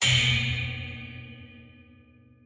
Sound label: structure blunt; Barotrauma; Subsurface; Damage